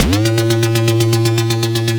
CH LFO; Hexagon style

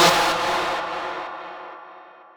Synth

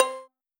Pluck C

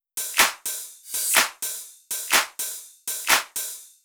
Top Loop 124

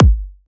Kick

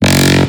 Lead; STMPD

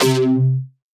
Bass C

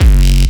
Bass Loopers; STMPD

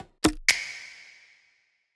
Fill 124; Several-Selected-Fill

